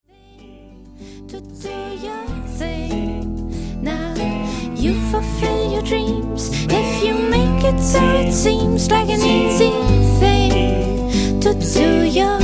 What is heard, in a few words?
scream